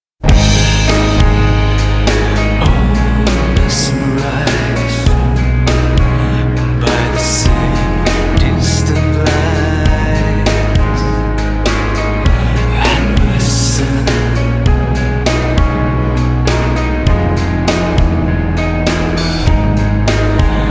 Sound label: Distant Drums